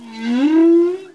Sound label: win Aquarium Open